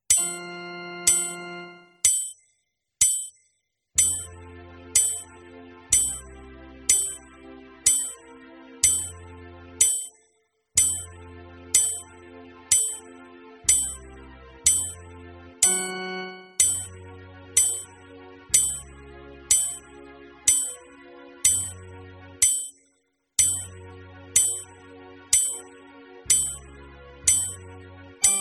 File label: warm up exercises